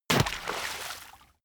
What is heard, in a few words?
body fall water swallow; krieg-waffen; nahkampf; soundpool